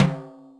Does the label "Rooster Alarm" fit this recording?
No